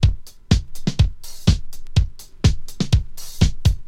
124 bpm drum loop f key, Free drum beat - kick tuned to the F note. Loudest frequency: 902Hz